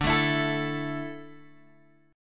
D Suspended 2nd D, down, Guitar Open G

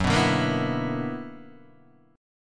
B-Minor Major 11th

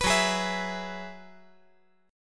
F sharp 7th Suspended 2nd Suspended 4th

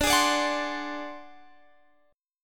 D 7th Flat 5th D down Guitar Drop D

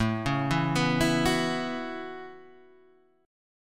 A 6th Add 9th A down Guitar Standard; A6add9; arpeggiated; JGuitar

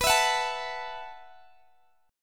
B 7th Suspended 4th B down Guitar Open G

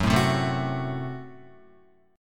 F sharp Diminished F sharp, Guitar; F#dim strummed

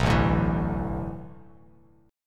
A Suspended 2nd Suspended 4th, Bass Standard; Asus2sus4 strummed

